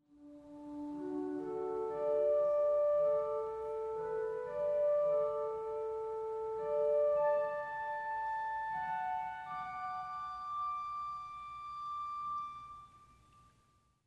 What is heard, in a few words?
Orchestral Flute